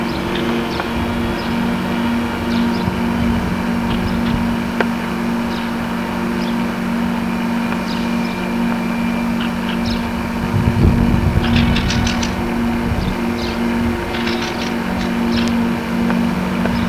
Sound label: bird stuff